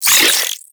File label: Flechette Low Clip; Warframe; Tenno; Oraxia